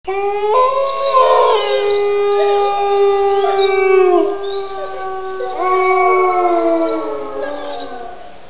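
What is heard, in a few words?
wolf; halloween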